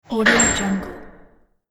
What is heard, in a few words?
factory ambience hit